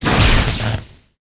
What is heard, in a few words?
Wizard Lightening; Inotia 3